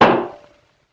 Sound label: Bass Drum Rim Shot; Vintage Cartoon; Drums & Percussion